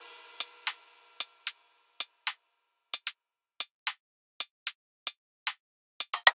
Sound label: Drum Loop 150 Top; Loops; Ghosthack - Color Bass Essentials